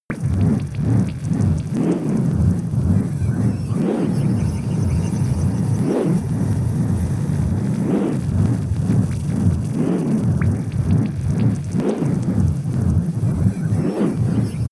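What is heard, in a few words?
bubble wobble low, Science Fiction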